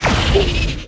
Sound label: energy torpedo fire; weapons; Stellaris